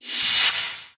heartbeat; disco danny

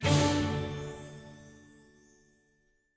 little win; fairyred

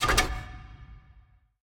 quick stop; rise of maya

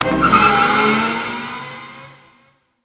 outro Free Spin Continue; hotline

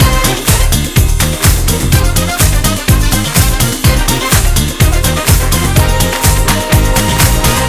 music loop big win; disco danny

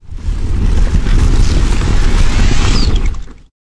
near Win; hiddencoinsofegypt

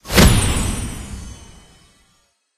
small win; cash noire

